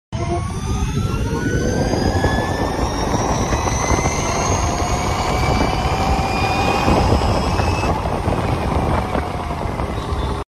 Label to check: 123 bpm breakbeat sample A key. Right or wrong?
Wrong